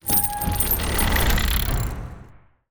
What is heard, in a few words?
alarm onager